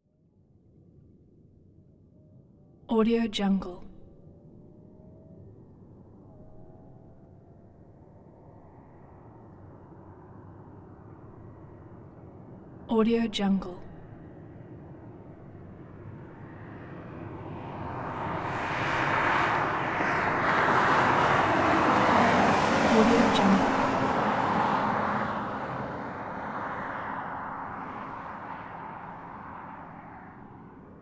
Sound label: 2002 bmw m3 car uphill pass by; prosoundfx